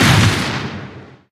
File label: giant common step; source engine; tf; mvm; video games